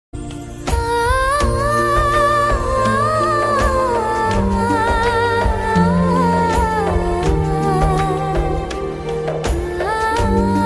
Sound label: Ghajini Melody Ringtone Ghajini Sad Ringtone